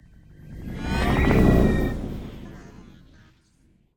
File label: whoosh space vehicle